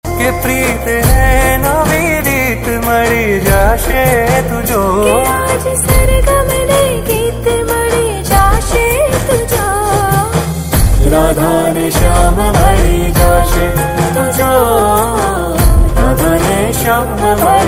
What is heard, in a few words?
gujarati ringtone